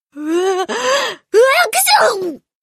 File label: sneeze